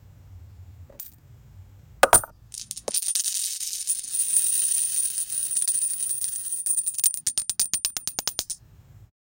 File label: cink cink sound of coins; collecting